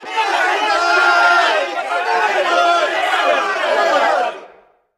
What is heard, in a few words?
Crowd Angry; Prison Architect